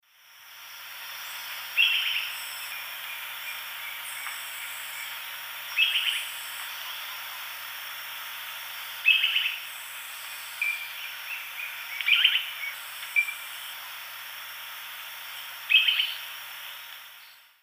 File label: Conopias parvus, calls; S. America; Eastern Lowlands